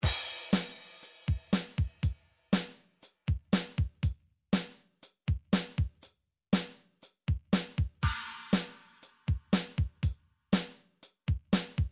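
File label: midi drums simple rock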